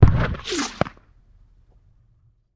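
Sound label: Cannon Cannonball; Sound Ideas; Guns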